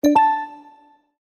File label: popup Open